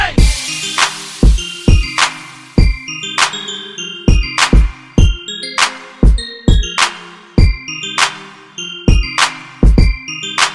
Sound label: Theme Trap Remix; Spider-Man Theme